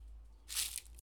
Steping On Dry Leaf

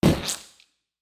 Vocal Splat

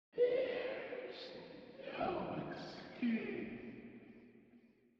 There's No Escape Creepy Stinger

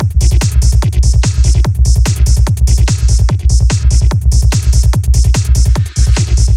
Psy Beat Sub Bass; Meta

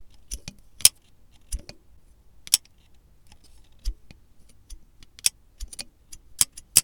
Sunglasses Steel Frame

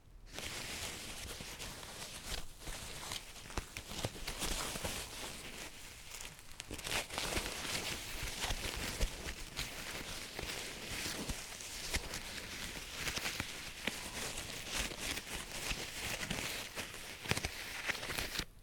Rustle Foam Rustle

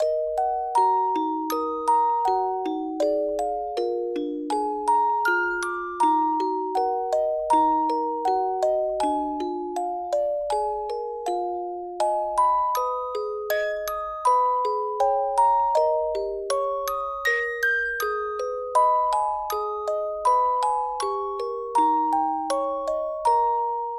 Music Box

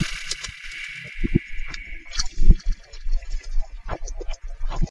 Mystirious Pulsing Loop

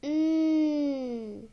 Mmm

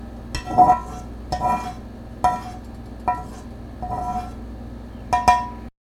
Scraping Pan

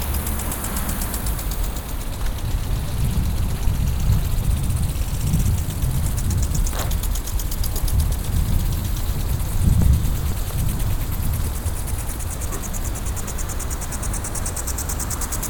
Sprinkler

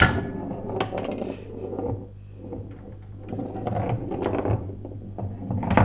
Ball

